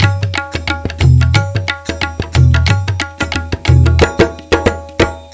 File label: Indian Mix C; Sonic Foundry; Hip-Hop Street Beats Construction Kit; Ethnicity